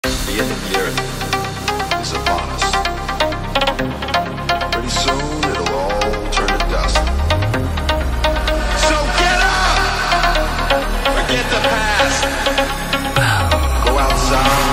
clubmix edm

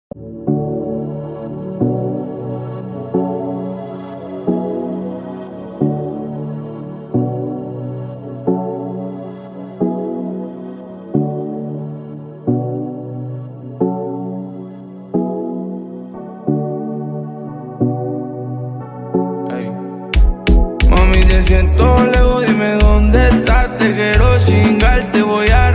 slowed reverb

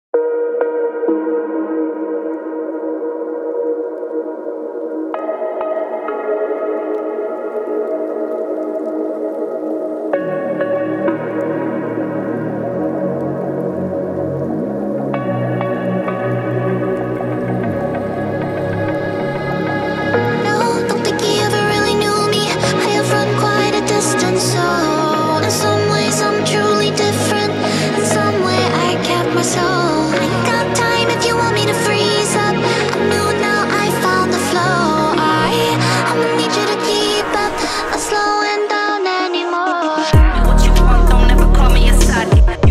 phonk

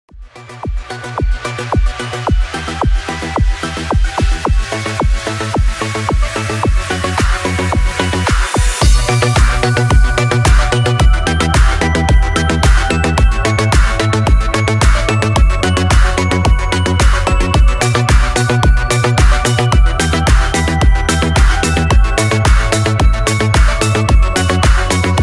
dance version

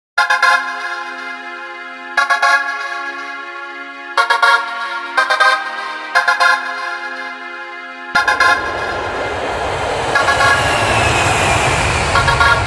instrumental maxi mix

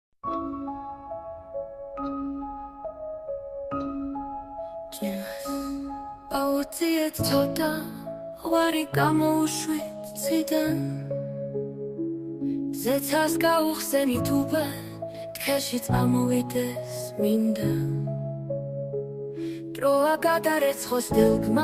georgianmusic

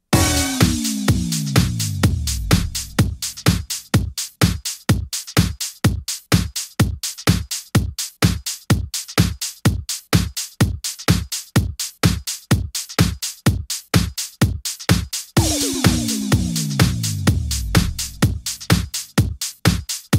club mix deep

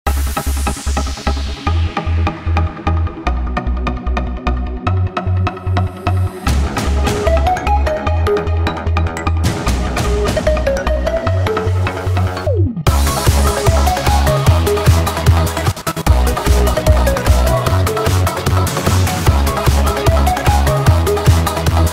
hardstyle remix

